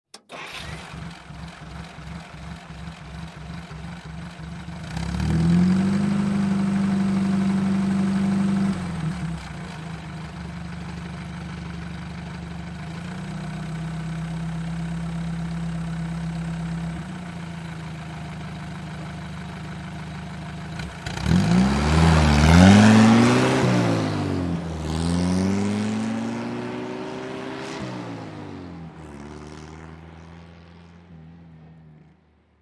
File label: Army Jeep Start Rev Fa